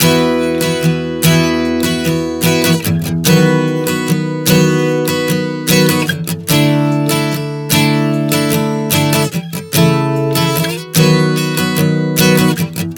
Progression 074 E-B-D-A, Acoustic Guitar, Chord Progressions 074 bpm; Sonic Foundry; Songwriter Acoustic Guitar Companion